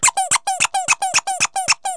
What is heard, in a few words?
squeeze, house fun; KABOOM! - Wild and Wacky Sound Software